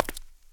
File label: concrete; firefight